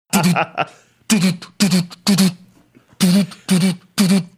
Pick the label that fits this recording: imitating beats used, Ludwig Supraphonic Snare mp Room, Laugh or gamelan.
imitating beats used